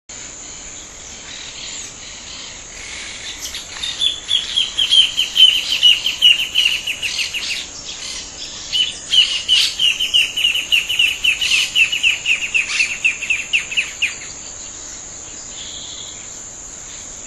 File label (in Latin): Phacellodomus ruber; stancia-La-Milagrosa; Jorge La Grotteria